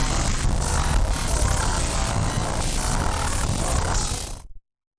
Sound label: Malfunction Synth, malfunctioning synth samples